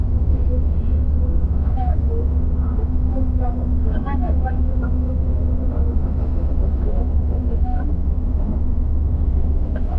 very subtle distant airport

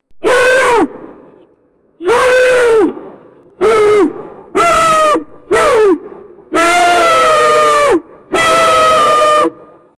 lion say fire fire please

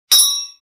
metallic inventory clink

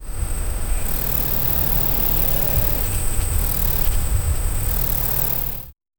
an owl flies in and, fence

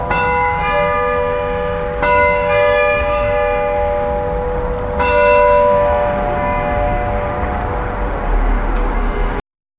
distant car horns and sir, city

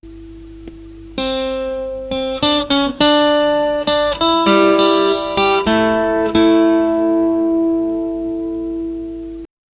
guitar playing chill love

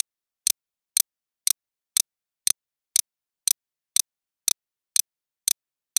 a loud ticking clock with

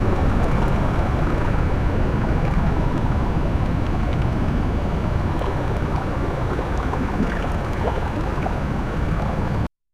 the sound of gentle waves, harbor

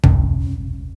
deep sound of heavy metal, cave

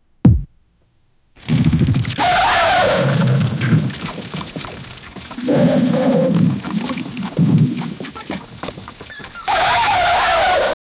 bark; golden savannah light

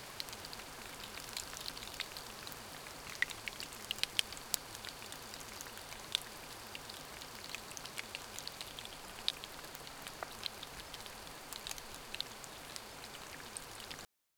debris flow; disaster

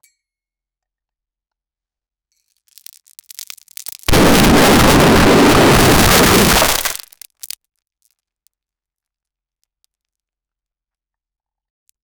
war and bullets, battlefield

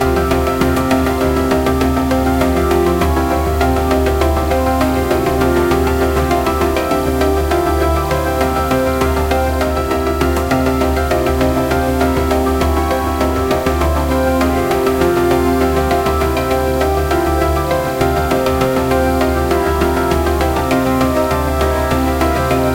looping music for a 2d, dungeon

